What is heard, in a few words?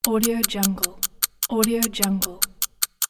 clock